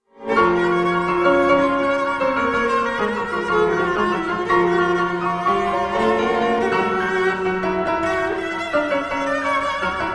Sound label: sonata for cello and piano in F major 3rd movement allegro vivo; richard strauss; op 6